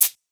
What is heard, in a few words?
chik